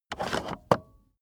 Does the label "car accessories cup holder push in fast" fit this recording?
Yes